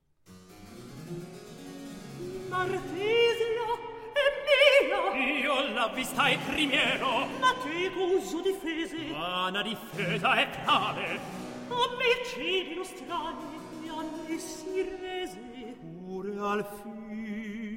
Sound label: Recitativo; Alceste, Telamone, Martesia, Ercole